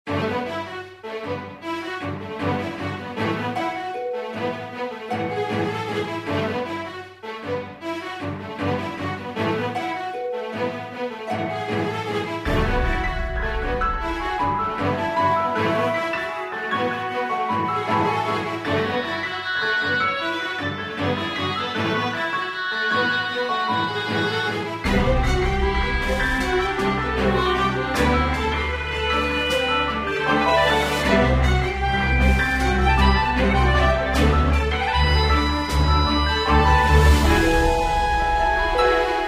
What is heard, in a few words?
Battle BGM